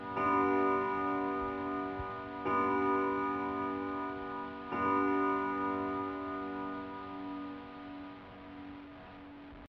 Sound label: chime